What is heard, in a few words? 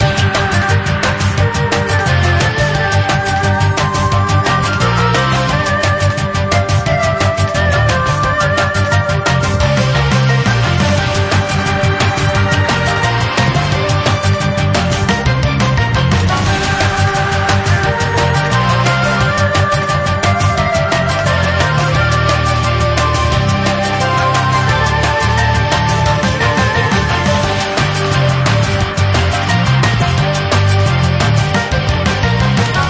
bgm; WaterMarginFishing